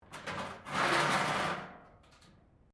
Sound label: metal rubbish bin drag concrete; Metal (Sound Effects:Materials)